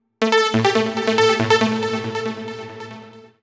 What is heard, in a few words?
TECHNO SYNTH 140; Keys